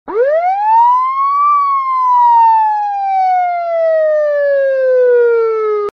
police siren; garrysmod

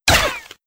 laser shot; garrysmod; nz; pap

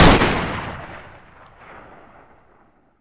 rem870 fire, shotgun; weapons; garrysmod

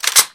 g3sg1 slide, weapons; garrysmod

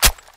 f2000 clip tap, weapons; garrysmod

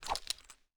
melee slash, weapons; garrysmod

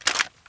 clip out, saiga; garrysmod

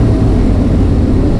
fourth shift down, car; vehicles; garrysmod; sincar

